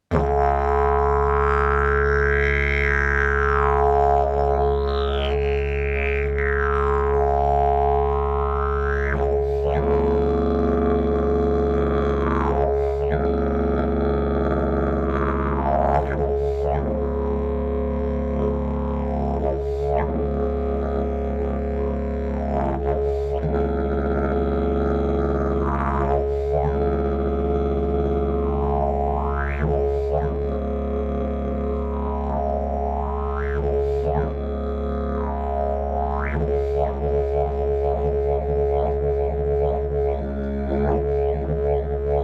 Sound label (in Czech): javor, didgeridoo; javor elegan